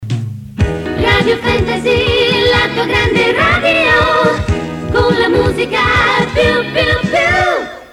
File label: radio fantasy jingle